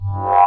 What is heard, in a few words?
kewl reverse, Synth